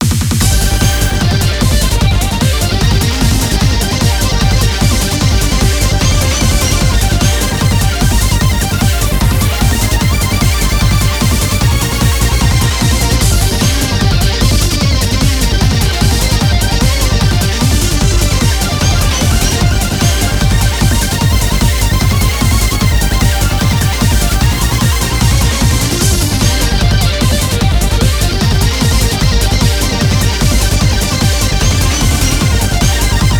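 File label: BGM Turnover Loop; Azure Striker Gunvolt Striker Pack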